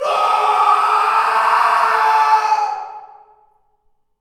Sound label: Male Scream